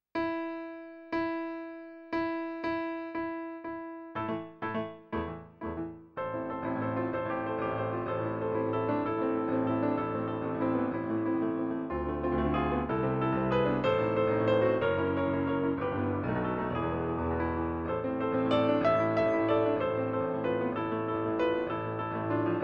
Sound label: piano; Zigeunerlieder 1 Brahms